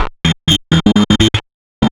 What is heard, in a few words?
Glitch n Glitz, Synths; Sonic Foundry (Sony Creative Software); Underground UK House & Electro